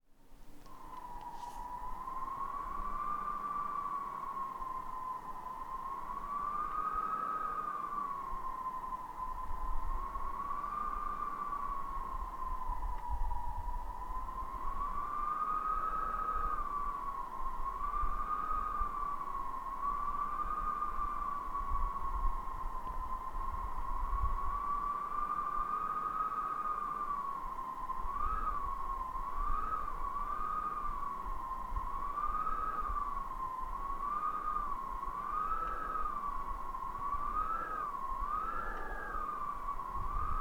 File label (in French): vent sifflant